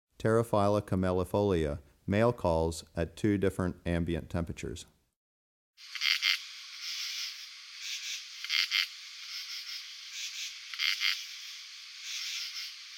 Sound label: Pteryphylla camellifolia, male calls at different temperatures; Crickets and Katydids